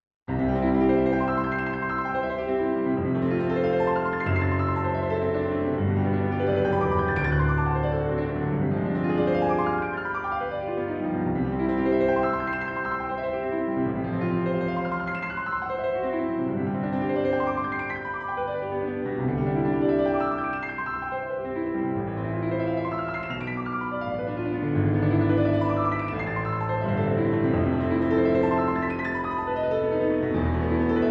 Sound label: Etude Op10 No1, piano